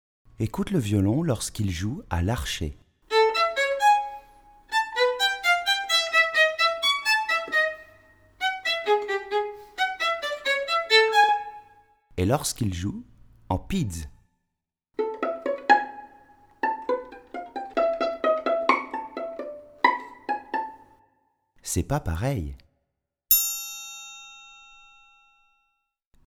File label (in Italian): Violino Arco vs Pizzicato